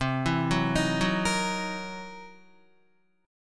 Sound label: C 7th Sharp 9th Flat 5th C Guitar; C7#9b5 arpeggiated; JGuitar